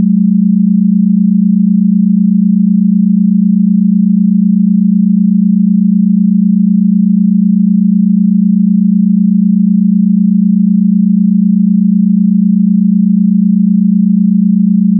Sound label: binaural beta